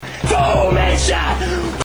boom; dod

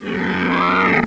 wolt pain; svencoop; sexr

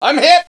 bandit flinch; gearbox

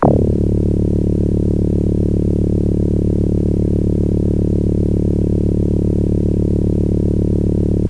door move; gearbox

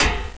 ladder

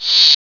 cricket angry; gearbox

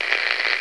radio shshsh; silent hill

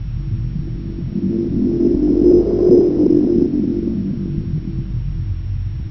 buttons sync; svencoop; adams dungeon

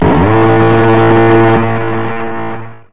a10 gun; firearms

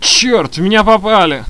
alpha wounded; svencoop; paranoia

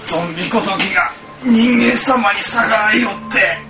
zombie; svencoop

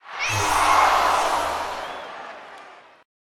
crowd death; svencoop; thearena

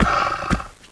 gonome run; svencoop

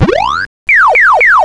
ghost eat

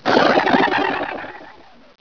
headcrab die; gearbox

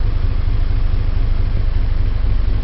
tank idle, ambience; gearbox

